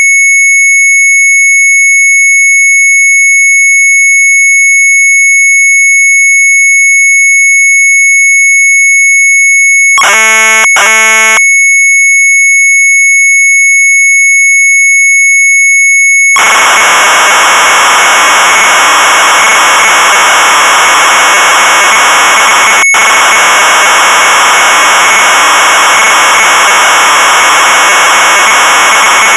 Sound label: MZ800 Tape; AUTO RUN; sharp; retromelsarchive